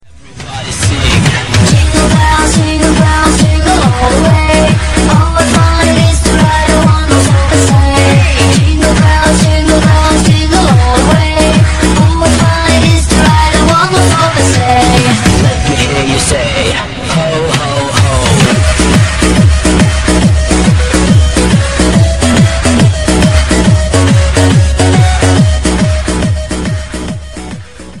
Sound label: jingle bells dance